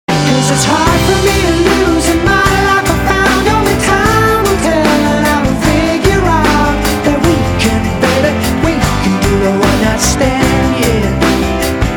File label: free loop